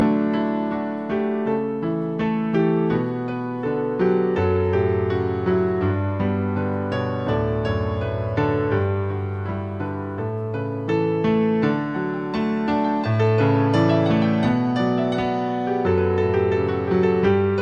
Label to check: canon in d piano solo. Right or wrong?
Right